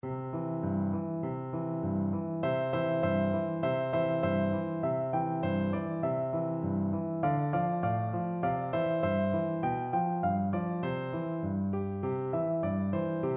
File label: Jingle Bells